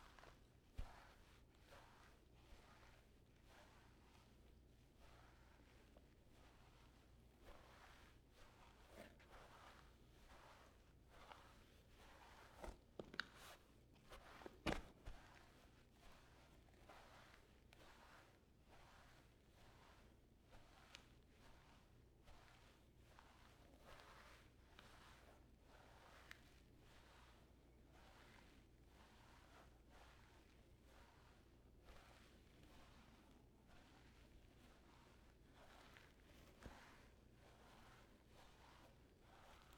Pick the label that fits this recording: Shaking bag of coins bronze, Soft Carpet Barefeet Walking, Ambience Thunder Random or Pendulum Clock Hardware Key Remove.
Soft Carpet Barefeet Walking